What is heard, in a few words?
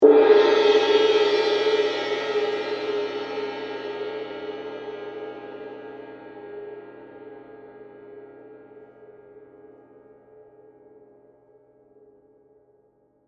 Cymbal Suspended; Musical